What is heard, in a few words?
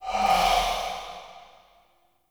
Quake End; Warframe; Decree